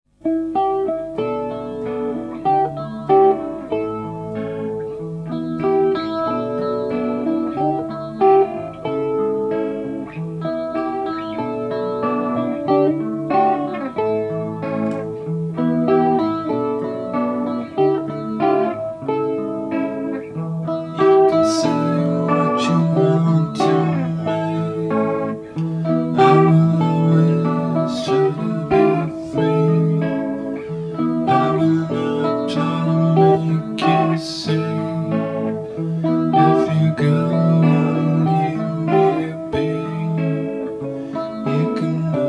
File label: bells